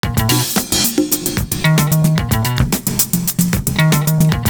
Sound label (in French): le bassiste; Univers Sons